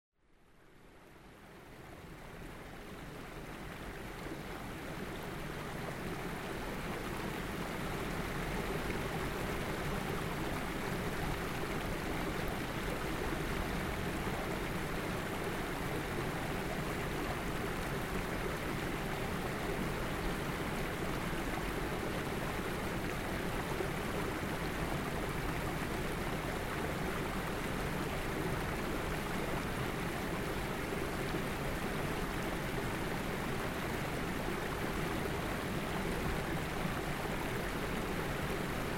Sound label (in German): schnell fliessendes Wasser